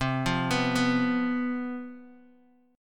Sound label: C Major 7th Suspended 4th, down, Guitar, DADGAD